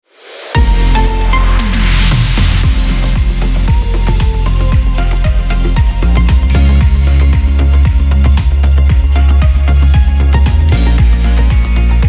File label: Traffic; Bremen Eins